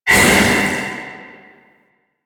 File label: Void Release Blunt; Weapons; Warframe; Duviri; Incarnon Adapters